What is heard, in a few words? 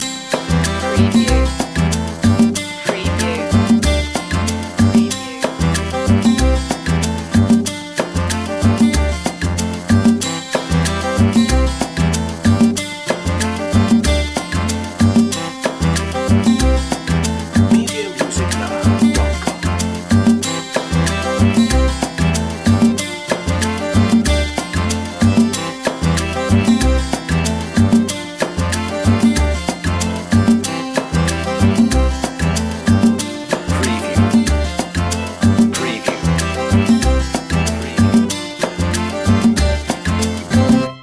guaracha groove